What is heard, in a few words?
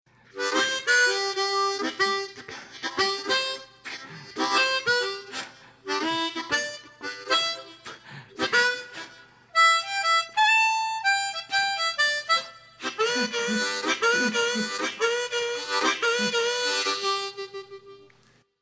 Audio Improv. Technique, armonica; clase armonica